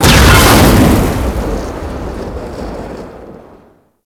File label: Metal Explosion; Warframe